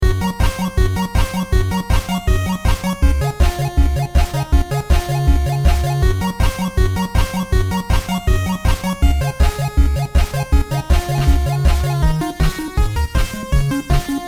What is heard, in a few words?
nes, Chiptune, 8BITS